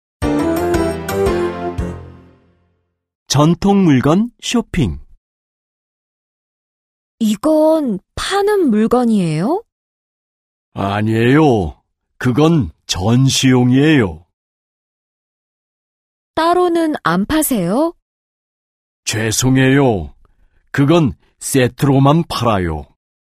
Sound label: K-POP